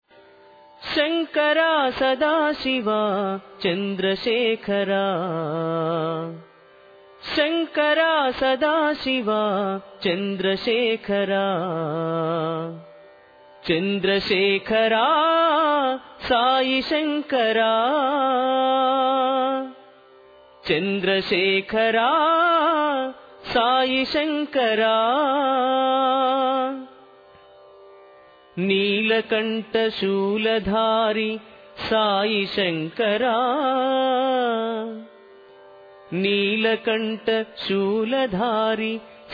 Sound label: TUNE; SHANKARA SADA SHIVA